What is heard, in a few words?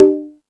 Latin Layers, Drum Machines E-MU Pro-Cussion